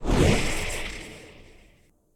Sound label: Silva Dispel